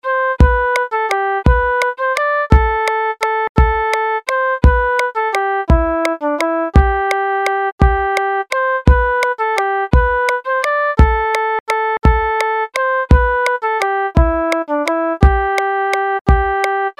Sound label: South Wind Waltz with moderate rhythm